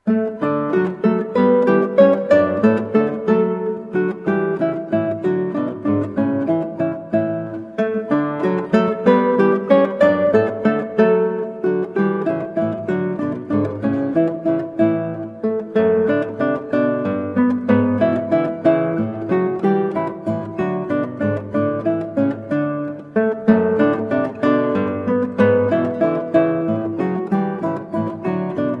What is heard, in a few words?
Menuet from Baryton Trio, Guitar; Baryton Trio 38 Hob XI 38; Joseph Haydn